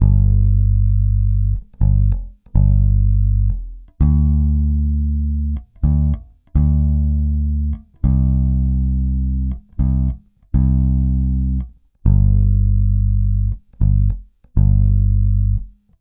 Pop, Bass

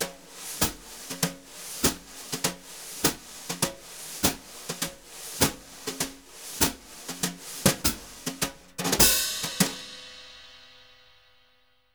BRU, BRUSH; Univers Sons; Jazzistic

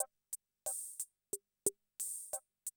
CR78, DrumLoops

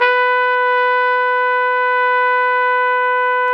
Flugelhorn, Solo Brass

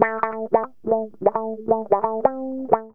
GTR, Funky; Zero-G; Funky Elements

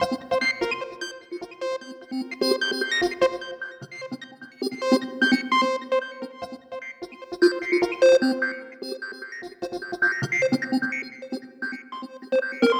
Seq Multi bounce, Drum & Bass; Sonic Foundry (Sony Creative Software); Knutrix Drum & Bass

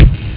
bdrum; Amiga Soundtracker Sample Packs

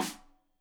Rim Shot Stereo; Deeply Sampled Drums; SMDrum

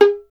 STR VLN, Solo Instruments; Miroslav Vitous

